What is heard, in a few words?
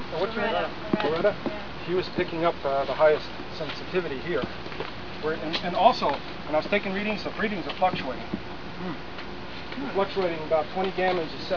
cornet